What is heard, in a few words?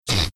snorting